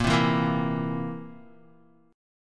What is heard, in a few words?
Bb Suspended 2nd Flat 5th Bb down Guitar, Bbsus2b5 Chord; strummed